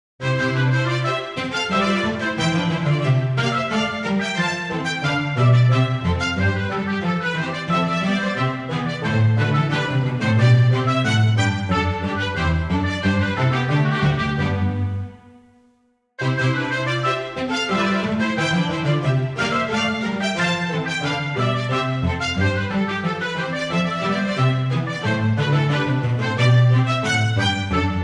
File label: Orchestral suite Courante; johann sebastian bach; Bwv1066